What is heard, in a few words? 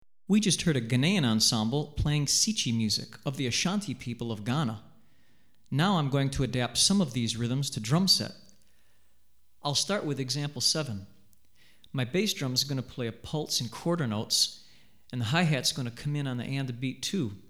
Sikyi, Drumset Example, West African Rhythms For Drumset; Hartigan